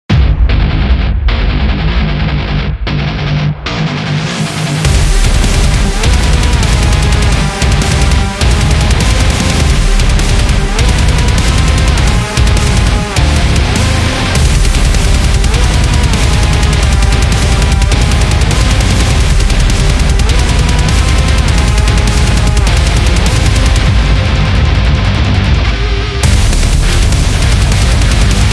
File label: Futuristic Metal